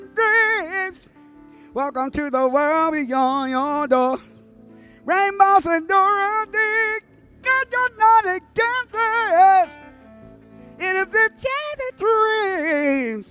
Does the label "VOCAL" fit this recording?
Yes